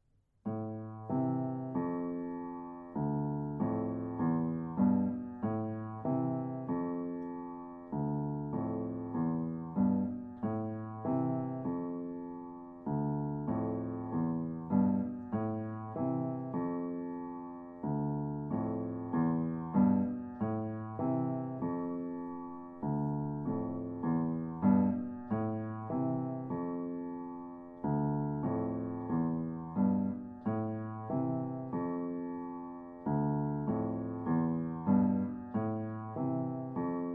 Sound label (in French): Piano Partie secondo